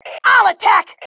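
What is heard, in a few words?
attack, female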